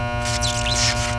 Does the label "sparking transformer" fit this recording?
Yes